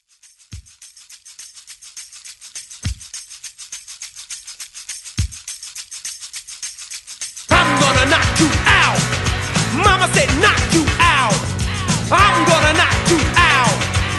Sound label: 80s Hype 103Bpm; DJDOLLS